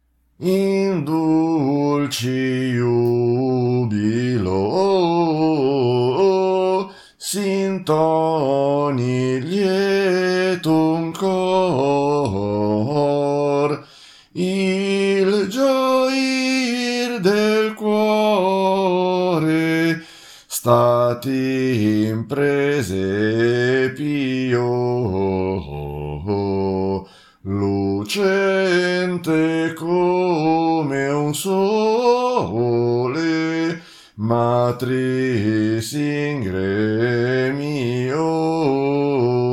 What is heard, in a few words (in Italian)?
bassi; In dulci jubilo